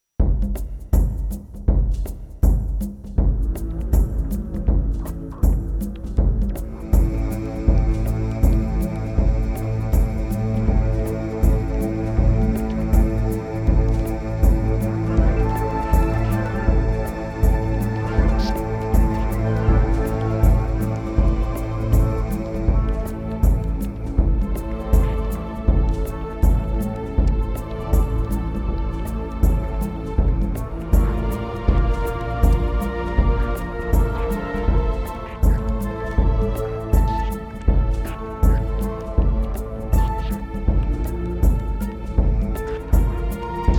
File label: Instrumental